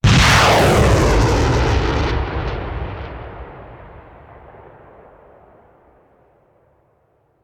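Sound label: Artillery Missile Launcher; Sound Ideas; Guns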